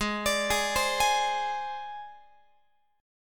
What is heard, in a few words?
Ab Major Flat 5th Ab Guitar; AbMb5 arpeggiated; x,16,17,18,16,19